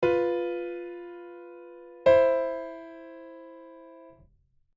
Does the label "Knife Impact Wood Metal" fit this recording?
No